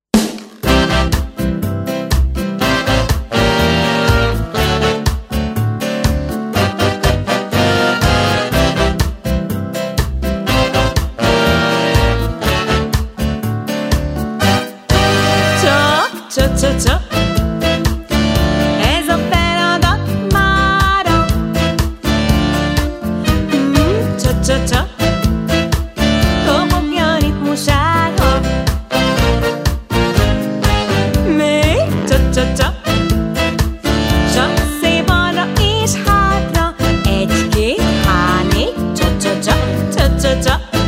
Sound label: cha cha cha